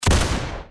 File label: Deagle